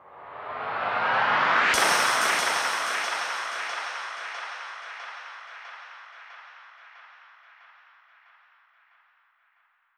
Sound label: Soothsayer Kit 138 BPM Reverse FX; Sound FX; Bminor